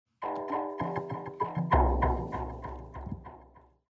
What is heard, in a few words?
suspense